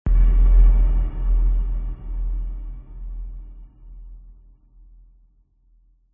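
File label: sound design cinematic hit dark lfe sub bass airy; zapsplat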